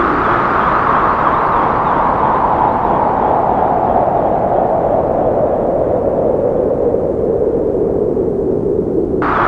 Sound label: VCS WIND; Zero-G Ambient 1